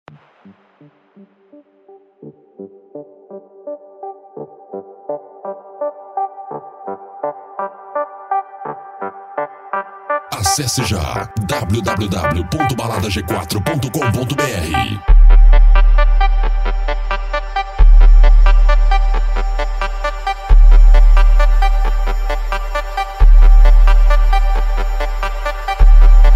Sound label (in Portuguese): cd mega funk eletro funk